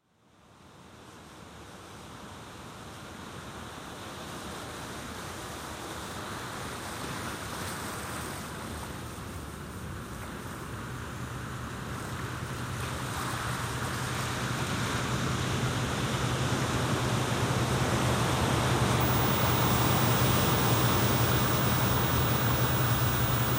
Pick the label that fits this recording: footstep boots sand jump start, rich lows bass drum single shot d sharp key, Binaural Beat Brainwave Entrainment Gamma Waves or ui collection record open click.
Binaural Beat Brainwave Entrainment Gamma Waves